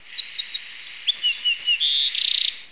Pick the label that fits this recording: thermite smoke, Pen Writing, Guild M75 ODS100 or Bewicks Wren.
Bewicks Wren